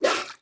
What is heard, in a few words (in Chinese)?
海豚喷气孔呼吸声